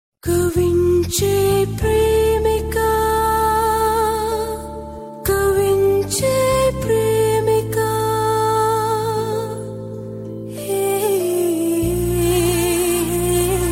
huawei p9 ringtone

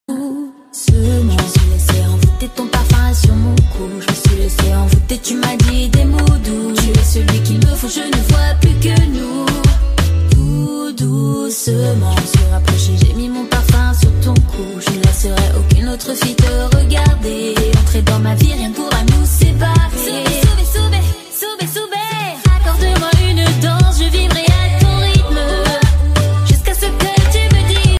french ringtone; iphone 8 plus

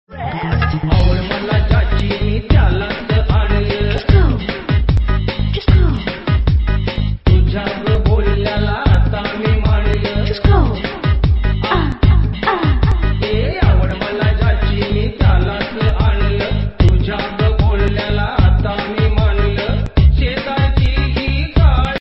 marathi ringtone

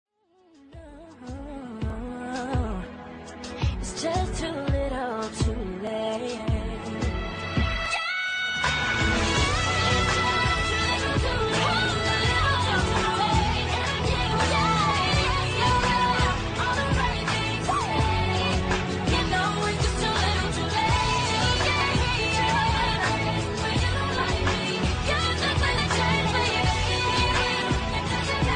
iphone 3g s rock kompa ringtone

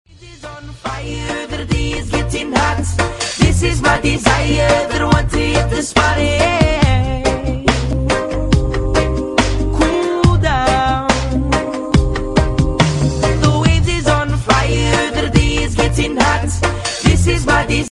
ringtone; iphone 7 plus

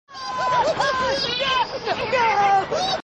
girl laughs sax ringtone

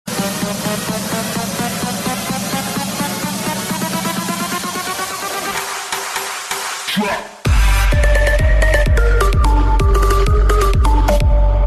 electro twerk epic ringtone; iphone 6s plus

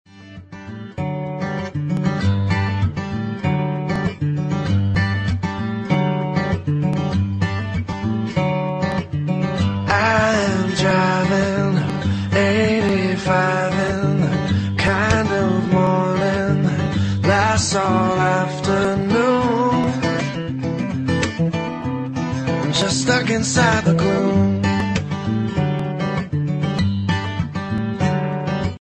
rock ringtone; iphone 7

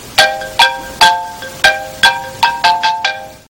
xylophone ringtone; iphone 3g; iphone 3g ringtones

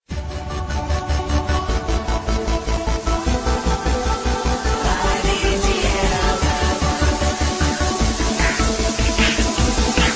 iphone 6s plus remix ringtone

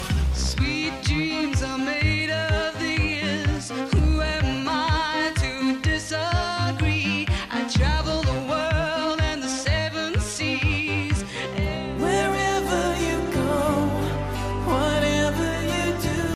iphone 3g 80s remixs ringtone

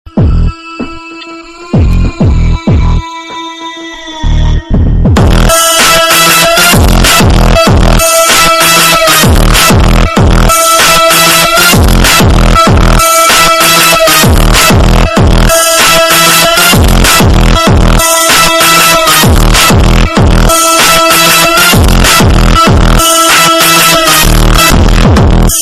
i phone 6 super bass ringtone

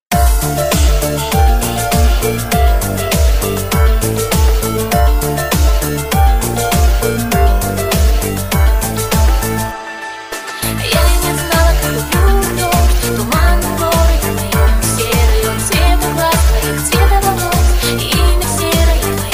remix ringtone; i phone se

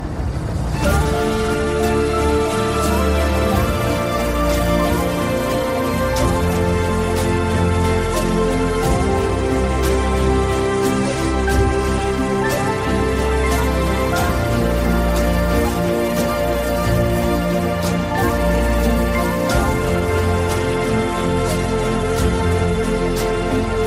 i phone 6s beautiful high beat ringtone

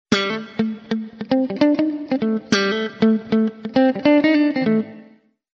electric guitar ringtone; iphone 6s

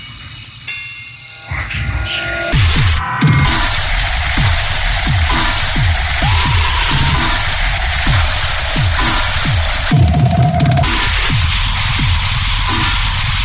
annoying i phone x x sym ringtone

